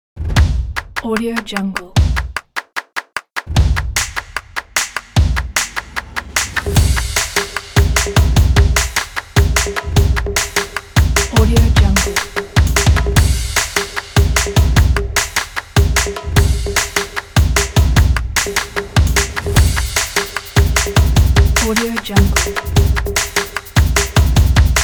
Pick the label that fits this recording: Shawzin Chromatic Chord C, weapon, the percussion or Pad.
the percussion